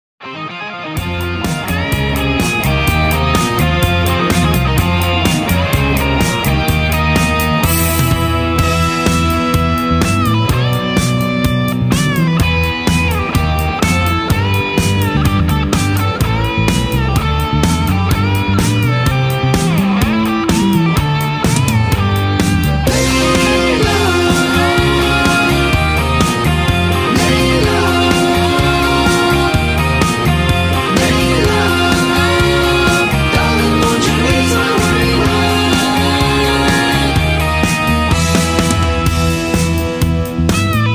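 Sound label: No Melody with background vocals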